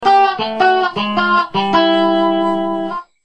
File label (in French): guitare electrique